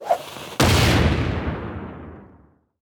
bomb; Animation Throwdown; cardbabies

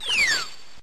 Roost

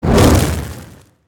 Fire bolt

